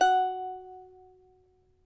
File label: charango F#3, guitars; Spectrasonics; Hans Zimmer Guitars VOL-2